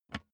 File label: office chair lever down